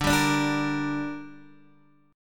D Suspended 4th Sharp 5th D down Guitar DADGAD, chord